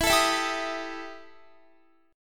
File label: E Minor Major 7th Double Flat 5th E Guitar; EmM7bb5; strummed; x,19,19,21,19,22